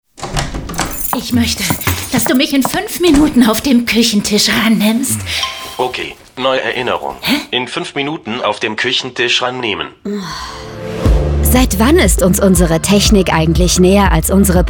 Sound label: Funk